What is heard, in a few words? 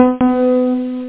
bebeep; Shareware Games Galore!; arcade